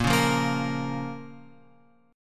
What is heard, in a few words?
A sharp Minor A sharp, Guitar; A#m Chord {6 4 3 3 X 6} strummed